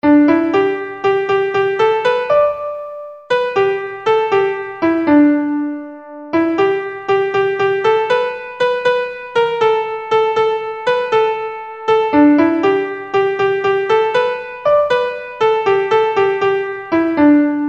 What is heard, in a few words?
narrowboatman blues melody